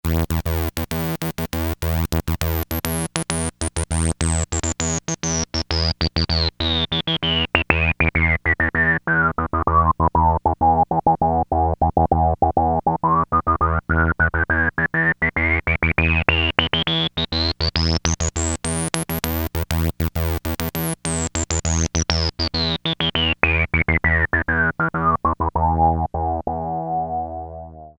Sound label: Filter Sweeps, Crumar Trilogy; Synth 7